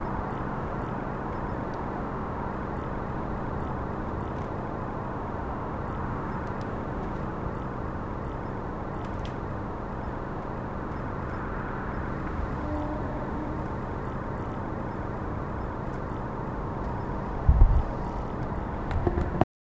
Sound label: the soft quiet hum of, city